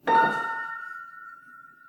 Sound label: piano